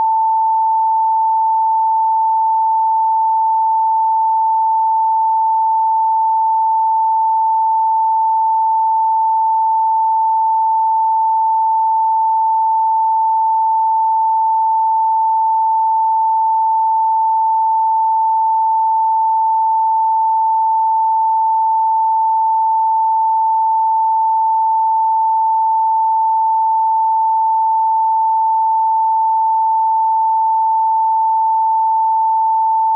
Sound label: 888hz; abundance